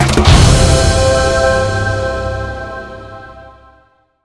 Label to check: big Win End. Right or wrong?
Right